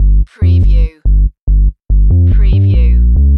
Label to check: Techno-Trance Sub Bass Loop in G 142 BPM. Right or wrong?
Right